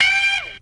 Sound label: ah sting; Voice; ooh ahh